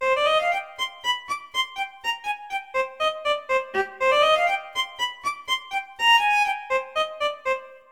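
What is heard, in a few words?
violin; Smeshariki Chiko's Computer; Skripka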